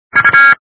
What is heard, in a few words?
lose beep